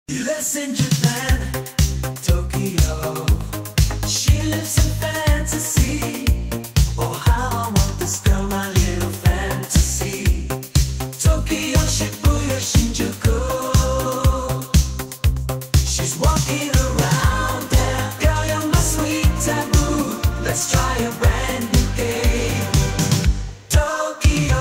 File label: ai italo disco; turko italo